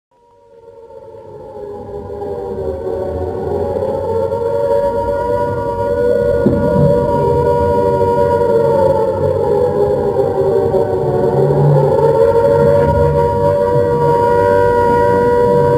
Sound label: air raid siren long